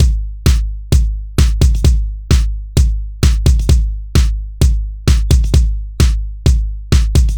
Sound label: The Rave, 130 BPM breaks loop; loops; Loopmasters; Jon Carter & Alex Blanco; Block Party Essentials